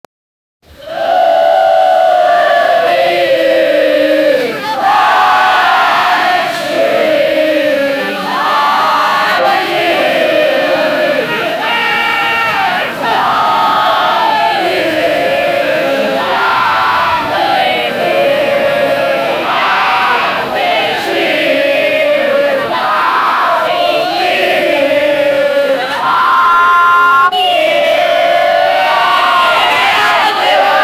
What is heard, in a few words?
massive crowd, birthday